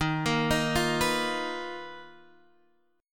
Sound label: B Major 7th Flat 5th Eb